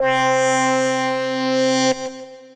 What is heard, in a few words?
Synth Lead